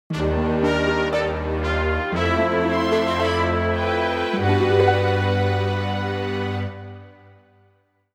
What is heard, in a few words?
Fanfare; The Legend of Zelda Twilight Princess Original Sound Version